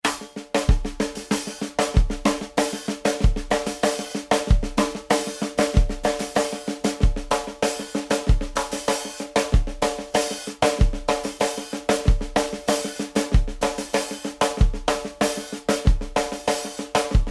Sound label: 95 new wave